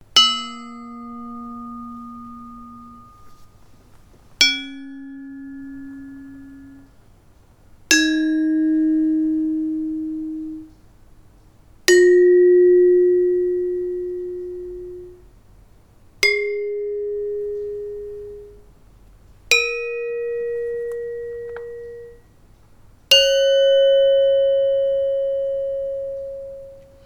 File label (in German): Saron gross Spitzhammer; Indonesien; HB-Samples